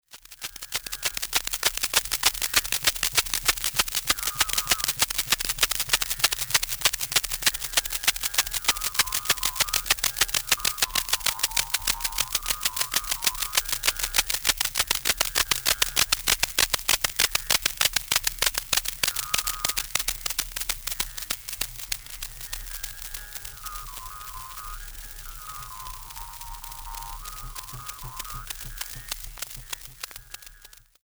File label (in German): Experimentalaufnahmen Maultrommel